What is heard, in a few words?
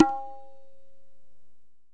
sur, Tabla Kit Small; ETHNIC and WORLD PERCUSSION